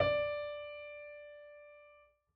piano d; Golden Crayon Sounds

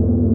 tank engine int; Original TK Sounds

